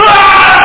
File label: Killed; Wargame Construction Set v1.0 (1987); SSI